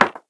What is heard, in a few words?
dice Coll Board; jumanji